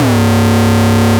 C Bass Freek

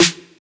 Snare; DancingKilla; dancing killa kit

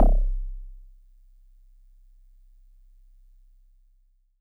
Celeste DX100 C1; Pristine Celeste

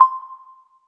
Clave C6

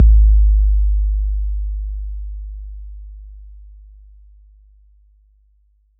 BD 808 Decay

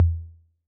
Tom Low B 808, Clean, Digital

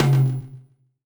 Tom Bounce Lo Echo

